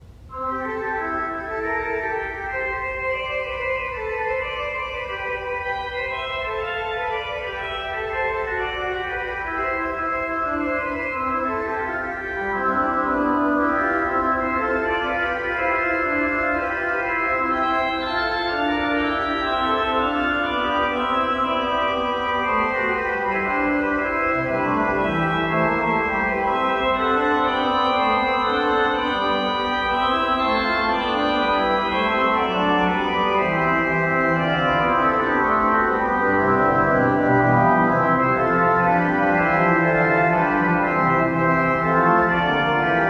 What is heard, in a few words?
jig fugue